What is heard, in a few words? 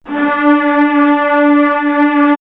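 string, orchestral strings; X-Static Goldmine; backtothe70's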